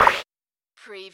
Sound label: CARTOON PIE